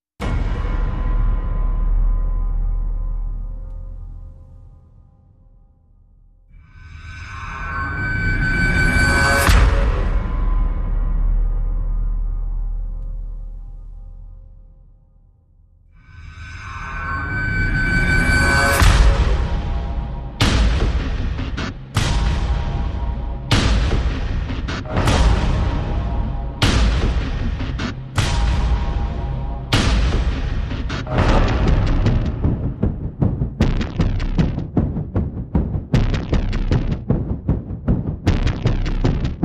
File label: Percussion Only